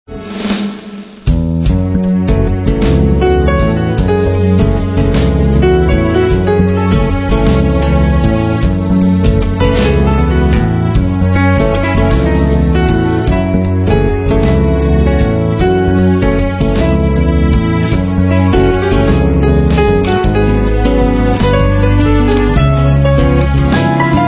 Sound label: Persuasion, instrumental